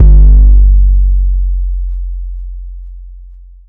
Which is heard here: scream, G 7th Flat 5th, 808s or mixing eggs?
808s